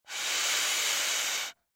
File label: Party Favor Noise, Party Favor Noise Maker Blow Drunk Broken Airy; Party Favor Noise Maker Blow Drunk Broken Airy Breath; Foley